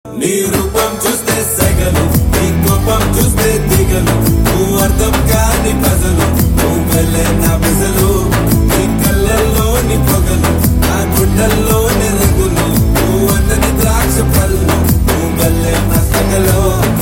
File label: Ringtone